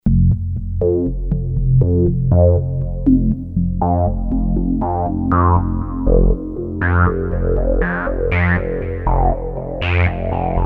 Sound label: Pro One Bowing Bass Step Seq